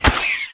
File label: Harpoon Hit Zombie; Gameplay Wpn Harpoon; Zombie Catchers